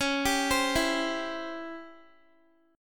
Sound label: Db Minor Major 7th Flat 5th, Guitar; arpeggiated; x,16,19,17,16,x; 4F,4A,5C,5G,5C,6E; DbmM7b5